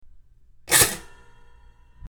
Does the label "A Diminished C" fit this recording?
No